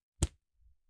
Pulls While Checking For Strength, Clothes

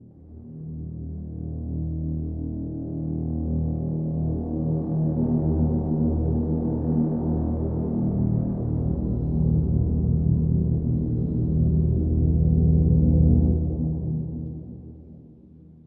Dramatic Brass

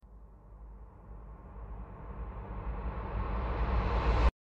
Low Riser

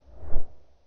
Fast Swish; Whooshes

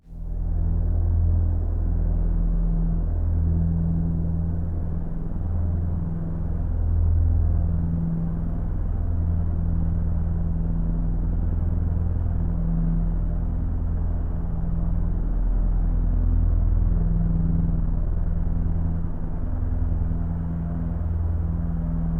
Drone; Drones

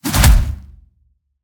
Slam Impact

